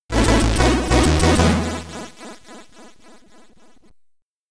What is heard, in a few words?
Get Ready! (SID Stereo); mission impossibubble commodore 64